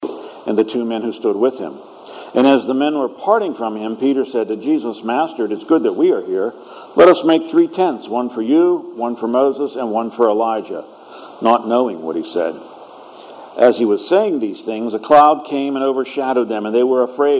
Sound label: Gospel